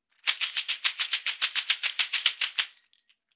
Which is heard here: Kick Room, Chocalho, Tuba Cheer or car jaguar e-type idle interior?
Chocalho